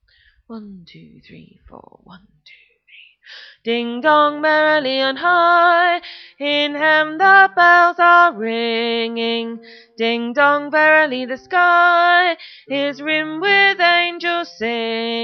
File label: Bass